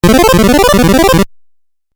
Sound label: 8 Bit Style Arpeggio; Video Game Sound Effects